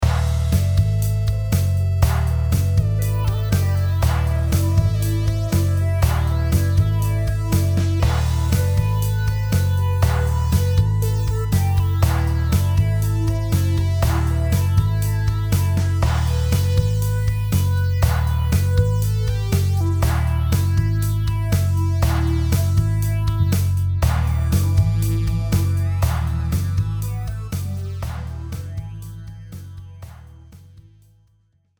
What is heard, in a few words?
song